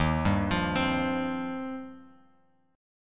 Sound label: Eb 6th Add 9th, Bass Standard; Eb6add9 arpeggiated; 11,10,15,17; JGuitar